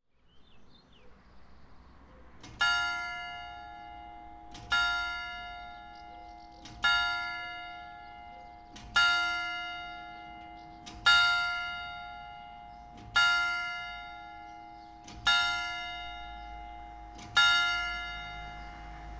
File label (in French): Horloge 9h; Vallerargue